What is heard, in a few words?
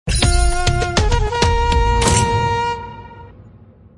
bonus game start; casino; corgipharaoh